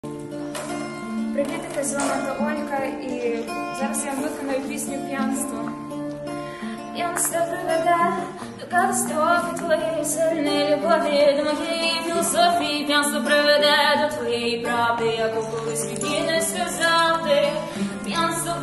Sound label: acoustic